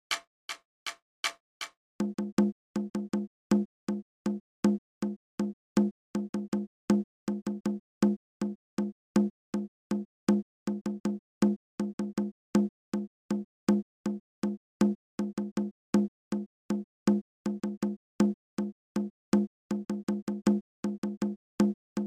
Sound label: Tom Toms, 160